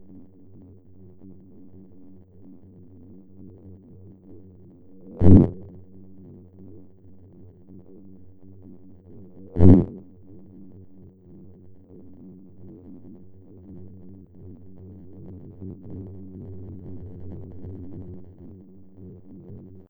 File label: test shake